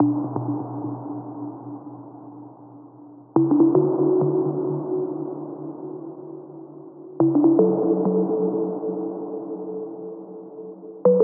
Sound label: Pluck; Serum